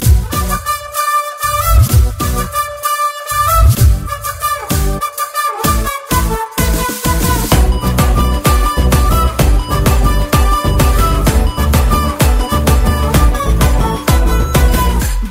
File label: Instrumental Tone